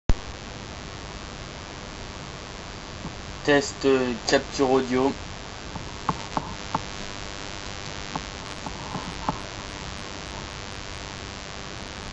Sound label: VX6000 Capture audio